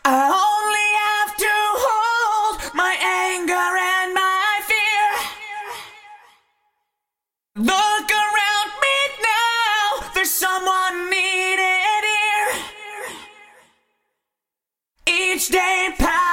Vocal Eventide H8000 Rock Vocal Rack Vintage Digital